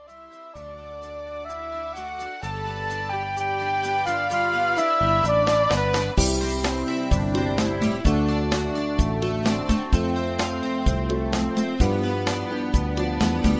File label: Instrumental with BGVs